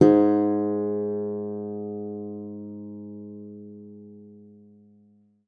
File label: G#2, guitar; Xsample VOL-2